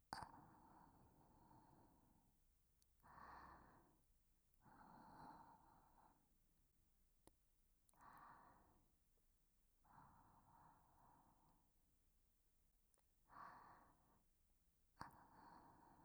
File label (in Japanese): 寝息左側